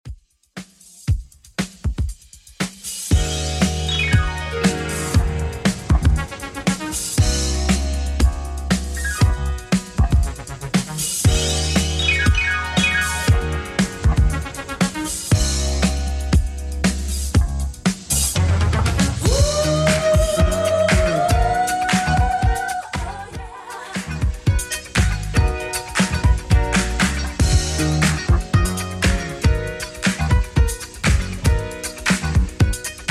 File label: 80s Redrum 118bpm